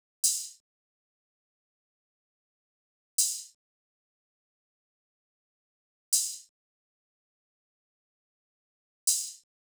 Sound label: Drum Loop 163 BPM Open Hat, Trap; Blizzard; Cymatics; ANALOG EVOLUTION Beta